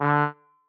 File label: marimba trombone; ゆめ2っき